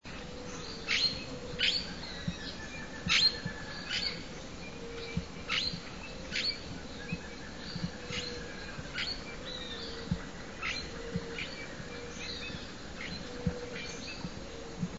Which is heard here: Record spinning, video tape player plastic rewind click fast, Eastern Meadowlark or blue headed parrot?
blue headed parrot